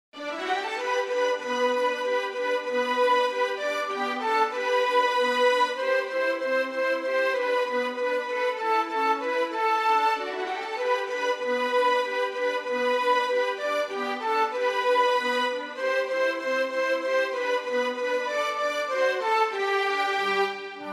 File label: Jingle bells, flauto dolce; impariamo divertendoci col flauto dolce